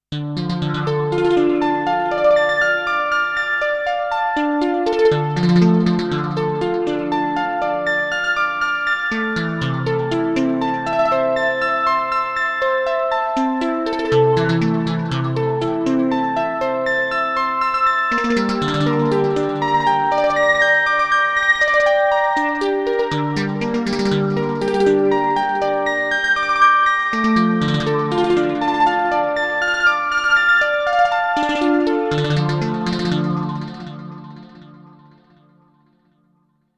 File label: ASM Hydrasynth Arp Ratchets